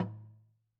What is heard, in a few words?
Log Drum Lo, Slit Drum; Idiophones; Struck Idiophones